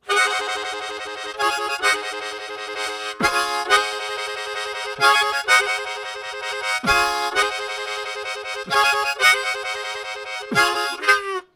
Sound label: Tongue Roll, Harmonica Riffs; Zero G - Harmonica